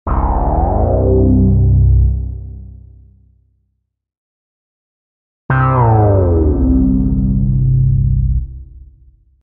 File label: Sliding synthesizer button; Music effect; Hollywood Edge Sound Designers Tool Kit